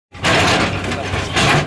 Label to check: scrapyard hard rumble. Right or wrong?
Right